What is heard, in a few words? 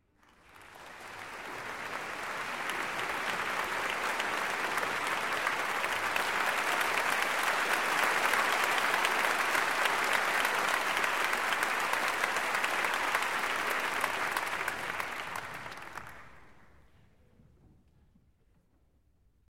Opening Applause